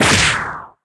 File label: punch